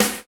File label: snare bassy; Roland L-CD701; Snares 6; Sn Modules 6